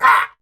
Pain; Warframe; Dax Knight; Battle Barks

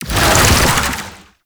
Infested Melee End; Oni

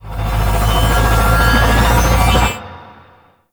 Comet Pellet Destroy Buildup; Gameplay; Warframe; Corpus Railjack; Comet Shredder

